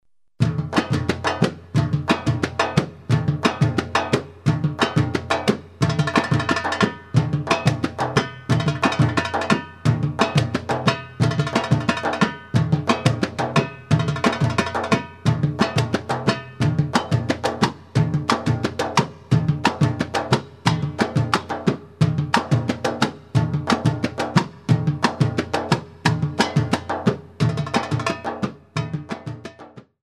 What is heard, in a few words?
Oriental Solo Tabla